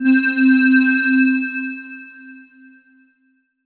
Korg M1 Digital 5 C4

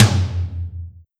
Yamaha RM50 Mid Tom

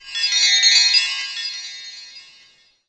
Korg M1 Wind Bells

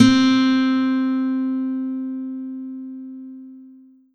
Korg TR Rack Nylon Guitar C4

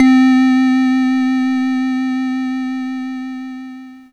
Casio MT 600 Vibraphone C4

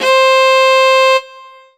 Alesis Fusion Viola C5

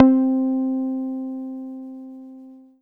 Casio VZ 10M Pizzicato Bell C4

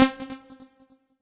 Yamaha MU90R Short SQ C4

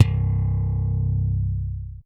Ensoniq SD-1 Slap Bass C4

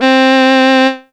Casio CZ-5000 Violin C4

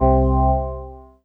Kawai K3 Organ C2

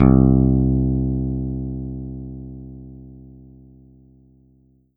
Kurzweil K2000 Finger Bass C2

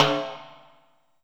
Low Timbales

